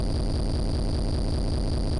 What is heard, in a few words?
Instrument